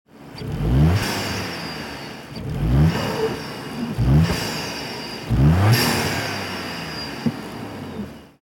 Stand, air intake system 2.9t EA839 RS4 RS5 B9; Ansaugung; pex

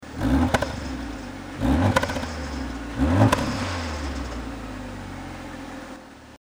RS4 Stand Klappe ZU, Abgasanlage Audi RS4 B9; Vor OPF

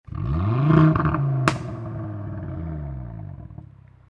AGA offen Launch Control, Abgasanlage Audi RS 3 Limousine 8Y; stoll sport

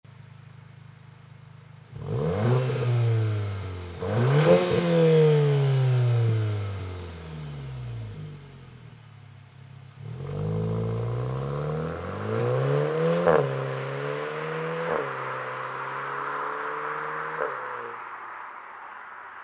golf 8 r variant geschlossen fahrt sound, Abgasanlage; Fahrt Normal Modus; apr

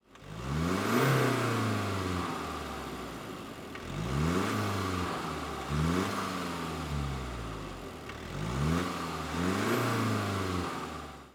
Stand ohne Intake, 2.0 tdi; Ansaugung

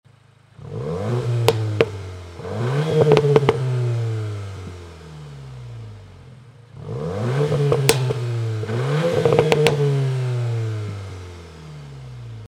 golf 8 r variant offen stand sound, Catback-System Abgasanlage; Stand Sport Modus; apr

